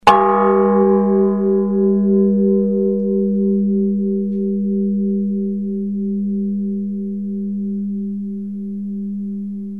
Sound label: 6 kg, bells; Pyatkov